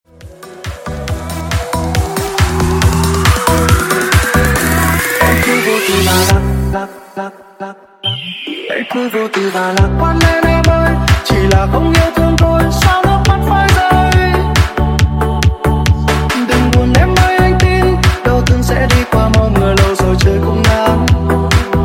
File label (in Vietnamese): nhac chuong nhac nen khua ao xanh remix tiktok